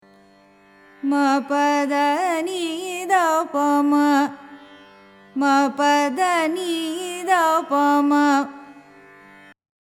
kalyani, carnatic music

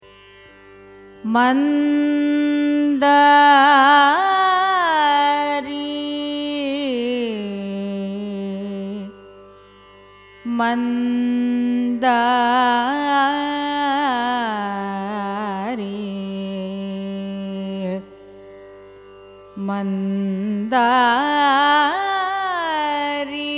raga mandari signature, carnatic music

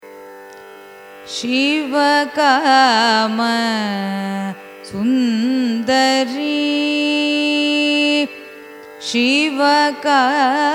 raga mukhari carnatic; carnatic music

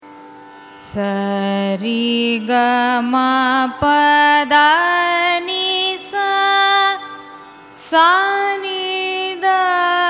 raga chala nattai arohanam avarohanam; carnatic music

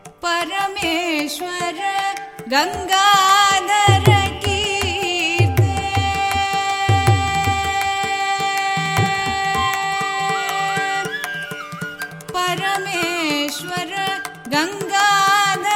mahadeva; carnatic music

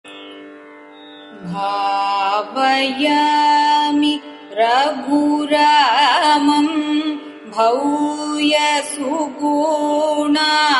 raga saveri cine

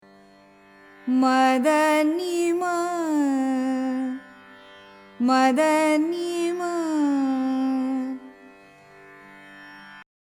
hindolam, carnatic music

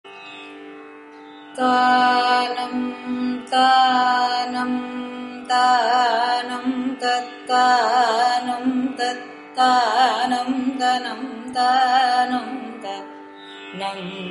thanam, carnatic music

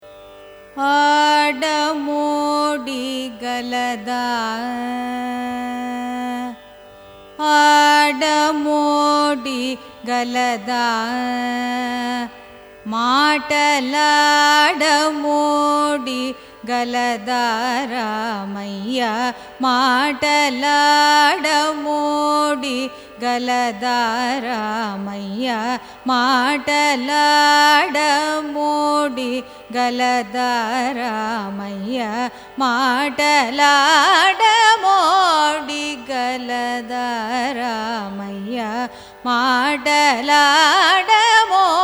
raga charukesi carnatic; adamodigaladha

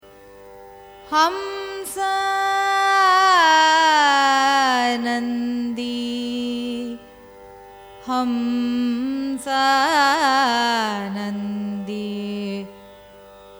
raga hamsanandi, carnatic music

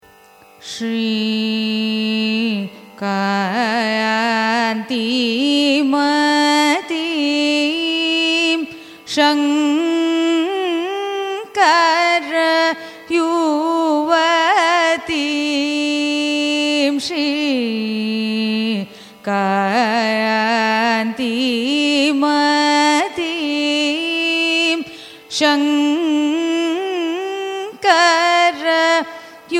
raga hemavati carnatic

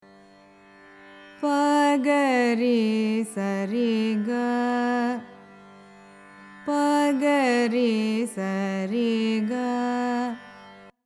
mohanam, carnatic music

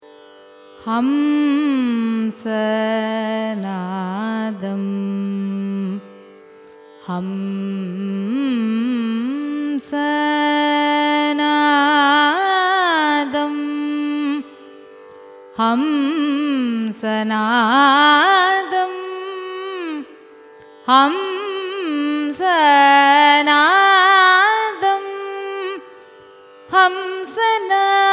raga hamsanadam signature; carnatic music

